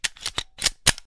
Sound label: chamber